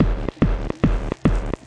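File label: VOCAL OR VOXY LOOPS, 143 bpm; MELODIC LOOPS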